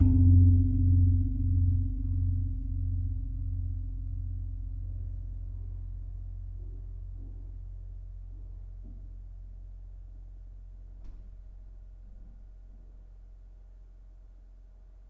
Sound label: Gong Ageng, gamelan